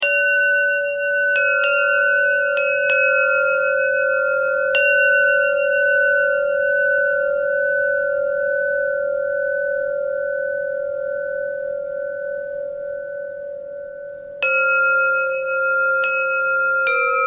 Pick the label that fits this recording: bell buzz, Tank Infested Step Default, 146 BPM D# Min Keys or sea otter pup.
bell buzz